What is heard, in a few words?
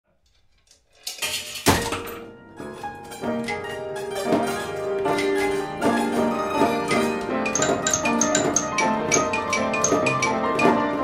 Orchestrion